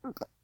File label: moan; Whorblox; ROBLOX GAME ASSETS